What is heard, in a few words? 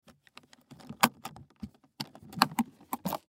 Make Up Case Latch, Make Up Case Latch Open Double Box; Latches; Foley; Sound Effects:Objects; SoundEffects-Blastwave